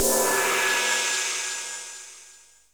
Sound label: SDS CRASH, Drums, CYMBAL; Best Service; Real Mega Drums VOL-1